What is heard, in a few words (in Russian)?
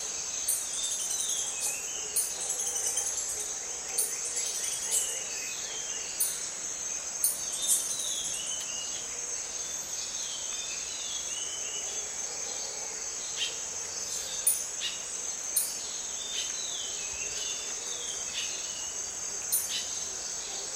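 успокаивающие переливы птичьего пения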